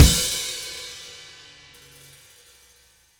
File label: Drums Outro, Indie Rock; Sonic Foundry (Sony Creative Software); Indie Rock Anthems; Session 10 - Roswell